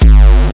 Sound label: Syn Dr, Overloaded Synthetic Drums; style kits